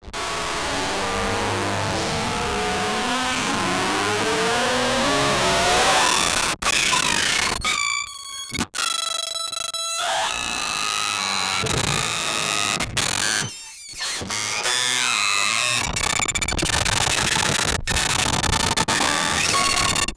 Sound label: old machine hacker, buzz